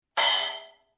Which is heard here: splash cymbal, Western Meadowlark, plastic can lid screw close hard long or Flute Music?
splash cymbal